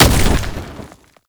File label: Hit; Warframe; Uriel; Infernalis